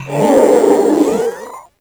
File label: Strike Scream, Proto Infested Ancient; Warframe; Abilities